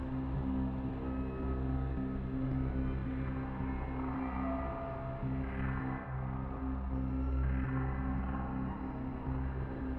space gui ambience; Forced Showdown

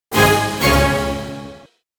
Outro Music

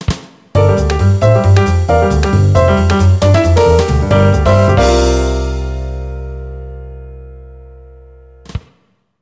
Coffee Break Start; Gran Turismo 4

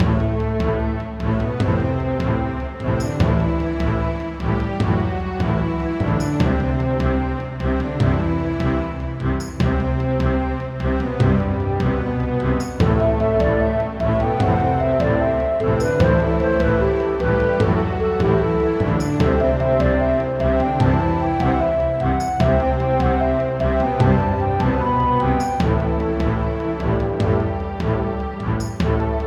Battle Music; Royal Frontier; Xbox Series X/S

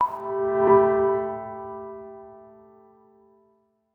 Pluck Rev piano; blek; android; wii u

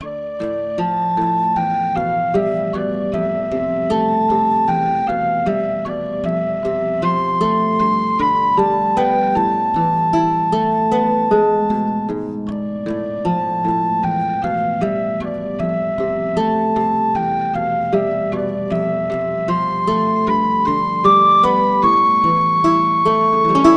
Dungeon; Violet Haunted

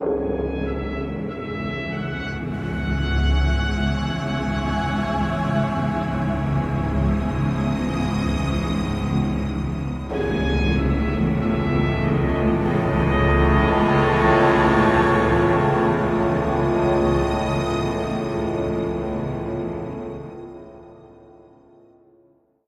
BGM; SOCOM U.S. Navy SEALs Fireteam Bravo 2; PSP

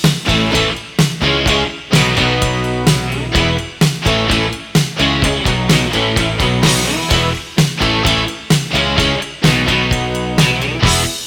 MUSIC DANCEMODE; lego dc super villains 2018 nintendo switch gamerip

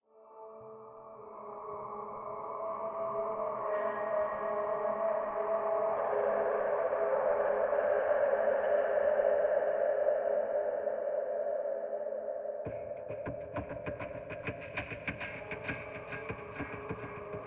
Gilman HQ Ambient; Modern Combat 5 Blackout

